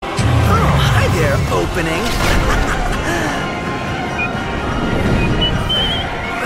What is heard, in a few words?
Whistle; Prompto